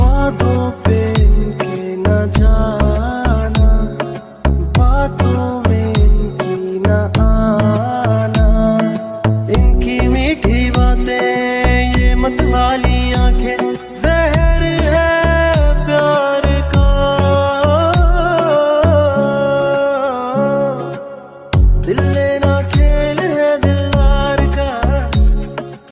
female love sad ringtone, bollywood

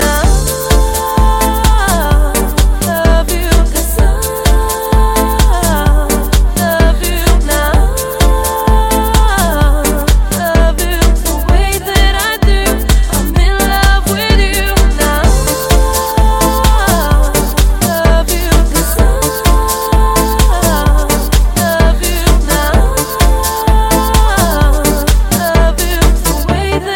house musical ringtone

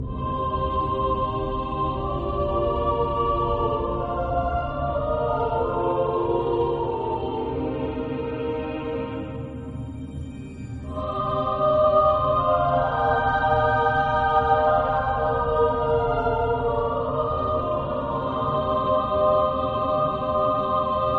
slow sad background music tone ringtone

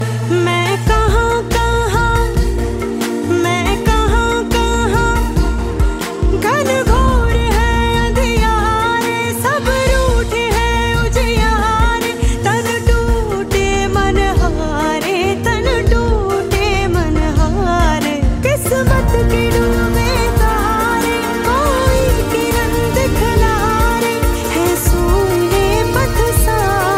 hindi ringtone, bollywood

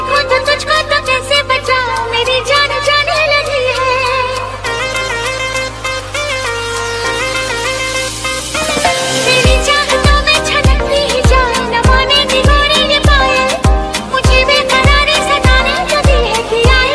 love sad ringtone, bollywood ringtones

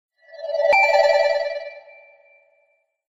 new iphone 4g sms ringtone

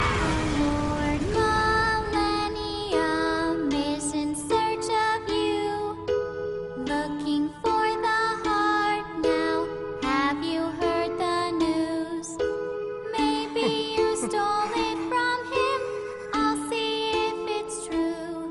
cute girl song tone ringtone; galaxy ringtones

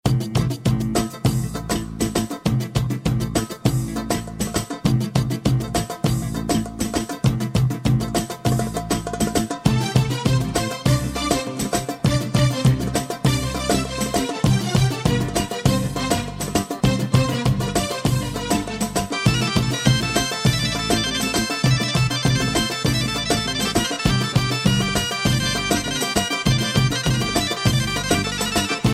dance arabic music ringtones

